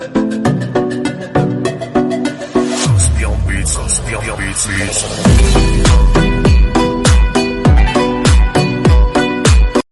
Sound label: bgm, background music; omg gadgets